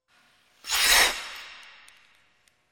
variant konkom po ldu; Звуки катания на коньках

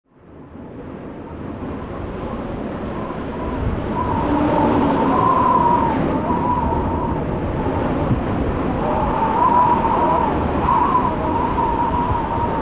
Poryvistyj veter

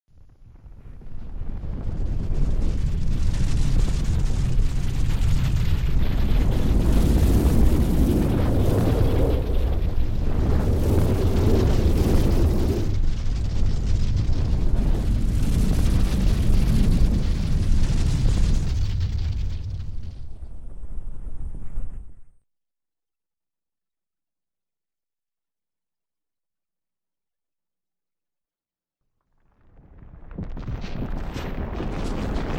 mnogokratnoe dvizhenie zemli

